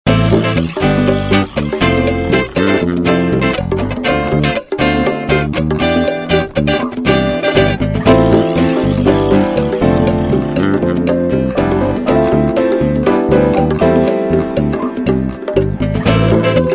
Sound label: gold guitars